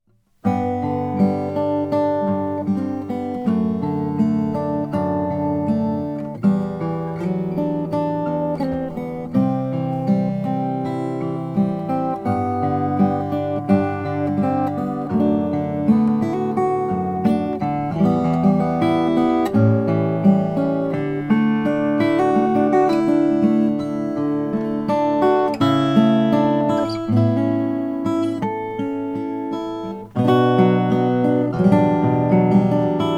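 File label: lowden s35 cocobolo, guitar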